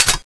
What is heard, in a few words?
clip out; tfa cso; turbulent 1